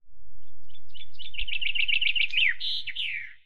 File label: birds; adelia The Fortune Wielder; Foxium